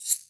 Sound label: Maraca Mini; World Percussion; Cymatics; Emperor World Percussion